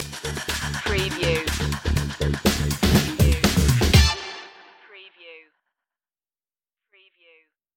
funky future stinger

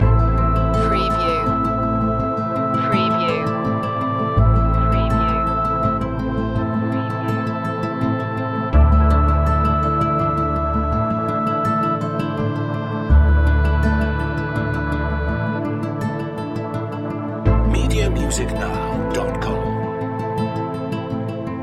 oboe in the woods loop

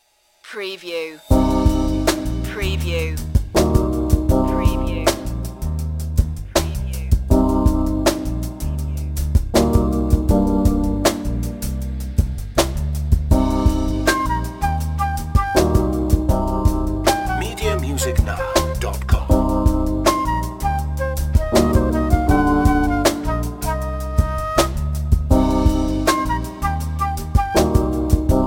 jazzy chill flute

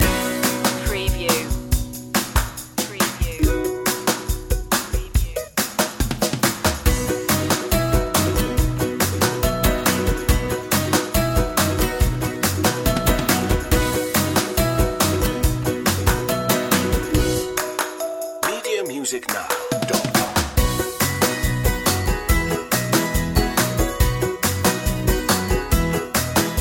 keep it simple loop

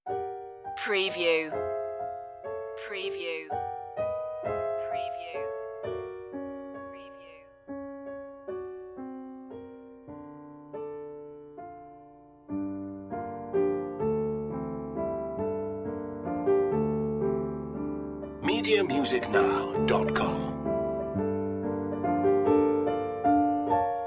waltz; ravel; waltz m61 ii assez lent avec une expr intense

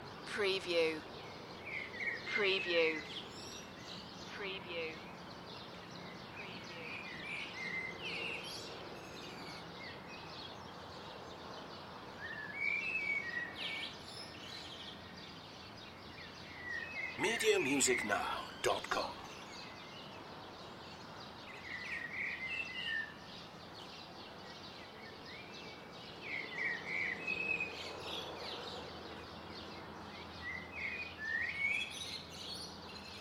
Residential Morning Birds Loop

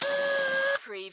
Servo Panel Sound - Servo

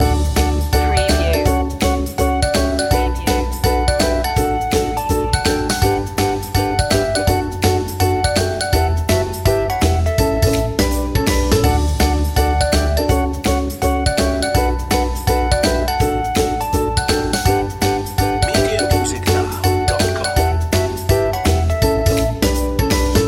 happy explainer loop

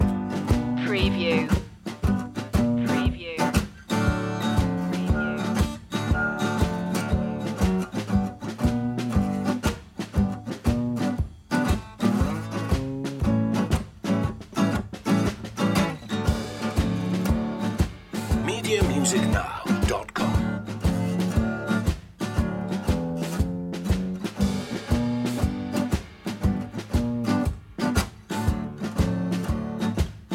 barbed wire blues loop

